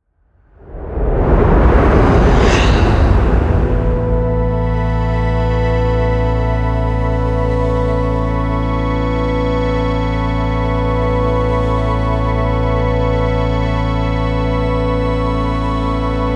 promo pad

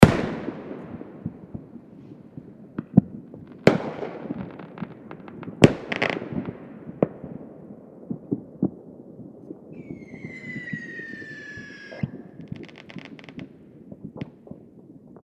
fireworks atmo

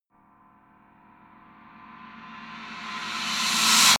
reverse hit high approach

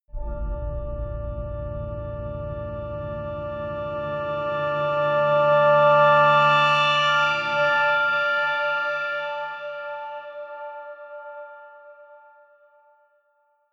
organ swell minor

